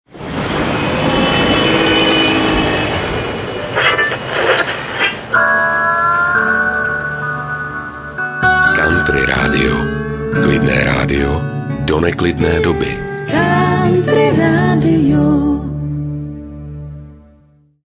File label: country logo